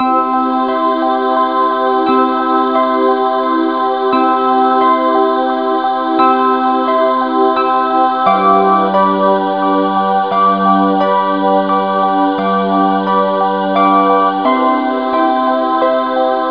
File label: lull